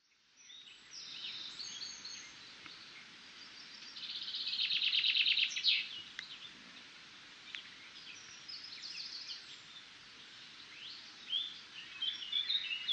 Walk Soundscape; NBGW

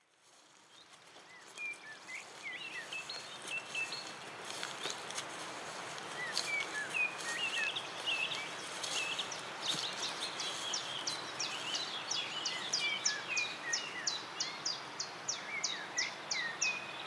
Skanda Vale Soundscape

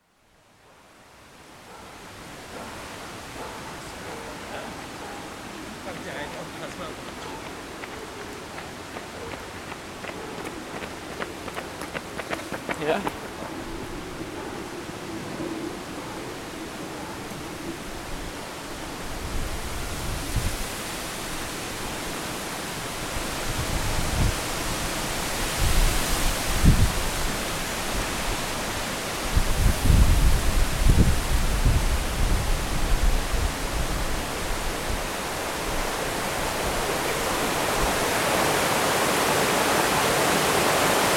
Gnoll Park Soundscape

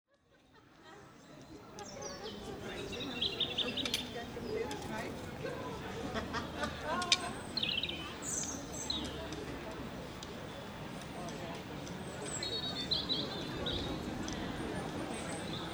Oxwich Soundscape

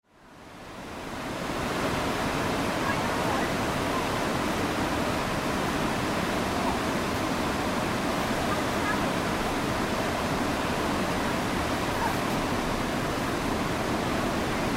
Weir Water

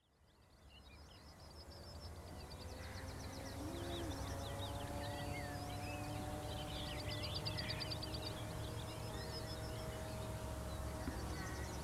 Walk Soundscape; Cwmdu

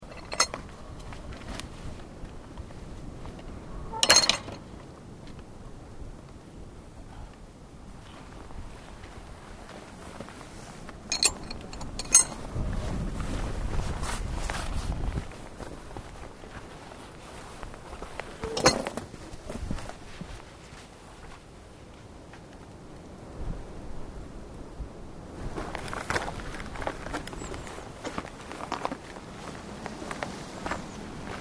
Gates Wind and Walking

